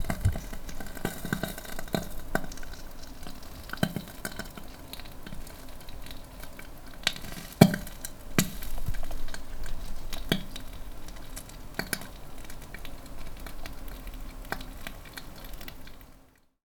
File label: Fire Staff, Fire sound effects